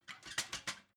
ladder metal handling

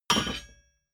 hammer hit metal

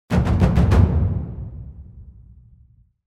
taiko ending

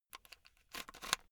headphone extend unsteady

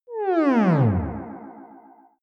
small ufo movements

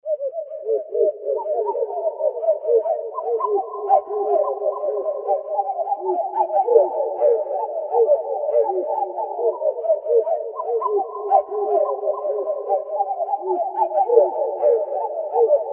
creature loop

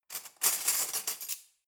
cutlery knives drawer grab

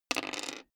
dice roll table hard

